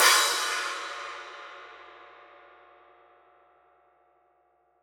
Trash C, drum

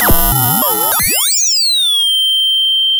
pop, sound of a bike bell

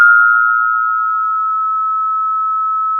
pop, Sound of a school bell

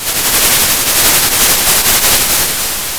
pop, Sound of a thunderstorm

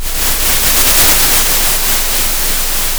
pop, Sound of a train

